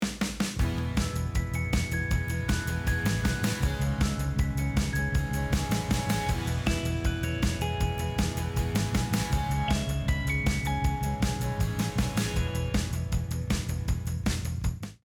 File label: Acoustic Rock Theme; KCTS